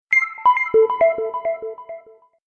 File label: Qmobile sms